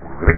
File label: land can; sc2